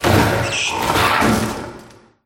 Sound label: headcrab scuffle; scripted sequences; distillery